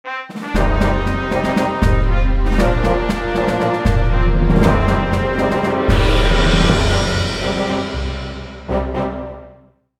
大气的背景音乐

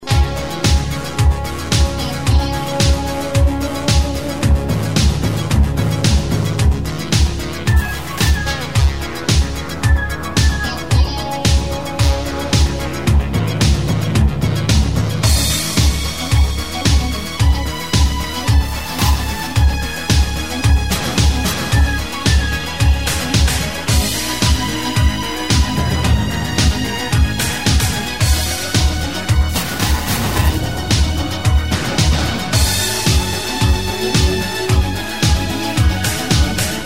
欢快的背景音乐; 3分以内

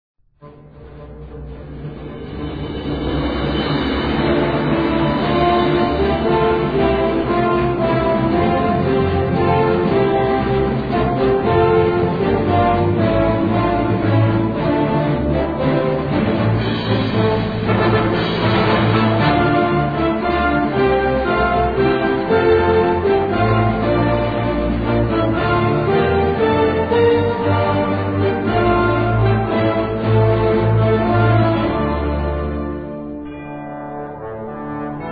专题片背景音乐

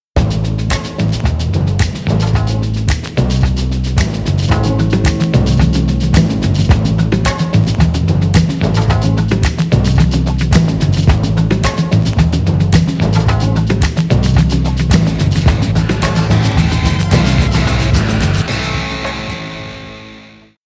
片头音乐

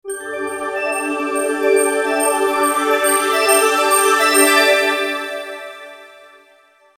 片头音乐

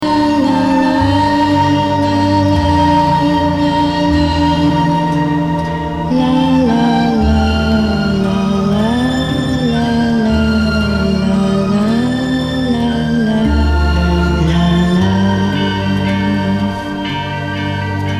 电影音乐; 奥斯卡电影音乐

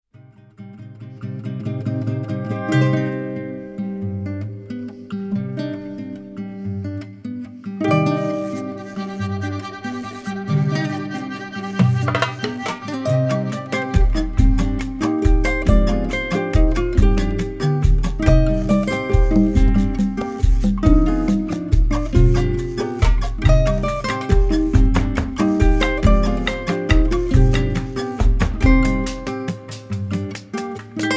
广告片音乐

